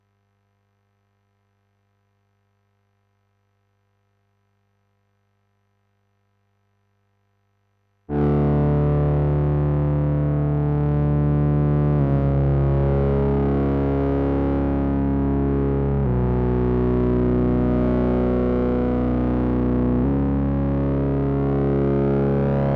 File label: Synth